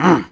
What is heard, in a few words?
Fight; Picnicboat